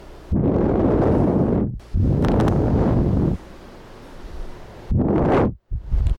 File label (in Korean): 후우